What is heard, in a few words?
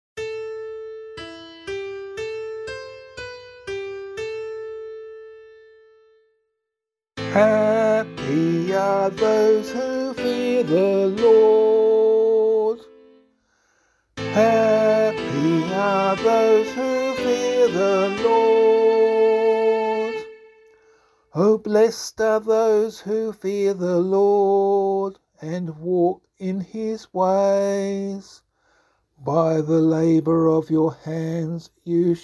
Psalm vocal